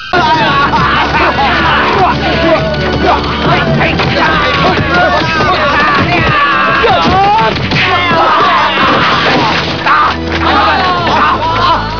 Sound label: Fight; 特酷声音素材库